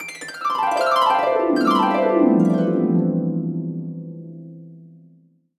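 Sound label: Harp Glissando; Wario Land Shake It; Harrp Glissando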